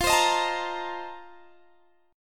F 11th F, down, Guitar Open G

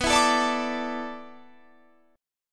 A Suspended 4th B, Asus/B chord; strummed

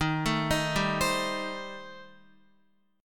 Eb 6th Flat 5th Eb down Guitar; Eb6b5 arpeggiated; JGuitar

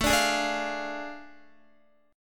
A Major 7th Suspended 4th Sharp 5th, Guitar; AM7sus4#5; strummed; JGuitar

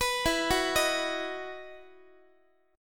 E Major 7th Suspended 2nd, Guitar

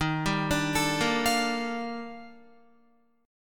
D sharp Major Sharp 11th, Guitar; D#M#11; arpeggiated

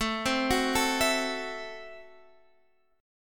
Gb Minor 7th Flat 5th, Guitar

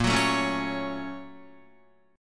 Bb Minor Major 7th Sharp 5th, Guitar; BbmM7#5; strummed; 6,9,7,6,6,8; JGuitar

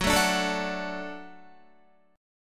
F sharp Minor Sharp 5th F sharp Guitar; strummed; JGuitar

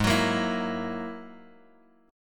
Ab 7th Suspended 2nd Sharp 5th, Guitar, DADGAD

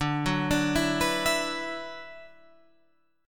D 6th Add 9th D, Guitar, Standard; D6add9 arpeggiated; 10,9,12,9,12,12; JGuitar

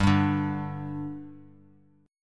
G 5th G Bass Standard, chord; G5 strummed

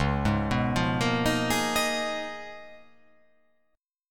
C sharp 13th C sharp

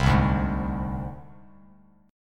C Suspended 2nd Sharp 5th, down, Bass Standard; Csus2#5 strummed; JGuitar

